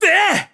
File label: Sword Shoot; Link; zelda sounds; Skyward Sword